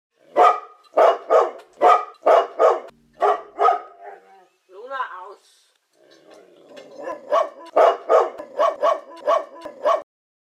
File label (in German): Hundestimme